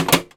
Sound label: industrial lever switch small; BluezoneCorp